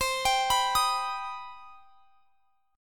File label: C Minor Major 7th C; CmM7; arpeggiated